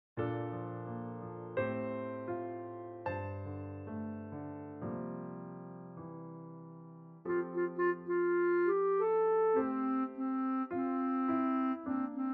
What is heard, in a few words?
song piano with guide; Little Mermaid; Ariel